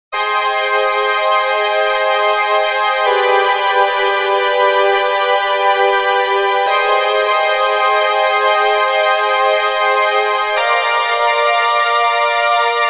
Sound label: octave string omni combi